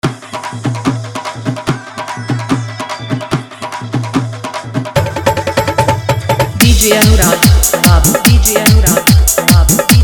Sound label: Hard Bass Mix